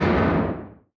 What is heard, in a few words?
distance mass driver hit, weapons; Stellaris